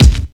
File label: rich high end kick drum c key